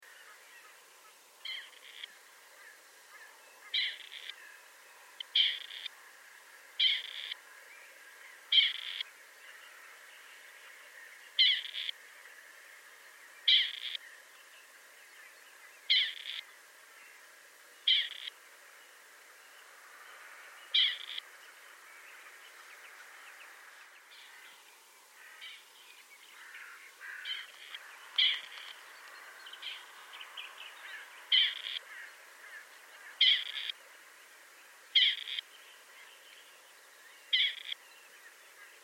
partridge sound

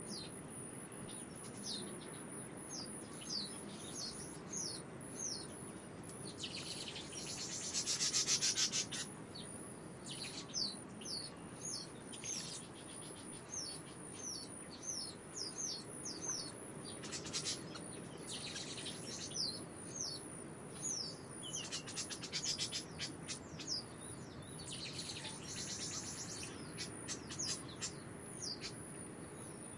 Orange-weaver, پرنده جولای نارنجی; صدای پرنده جولای نارنجی

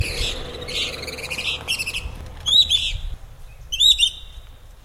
Giant kingbird

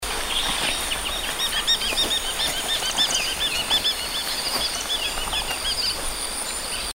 Madagascar pratincole; پرنده گلاریول ماداگاسکار

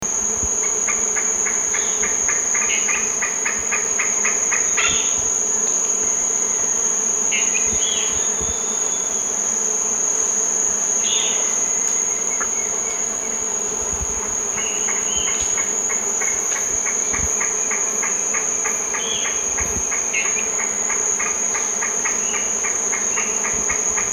Green aracari

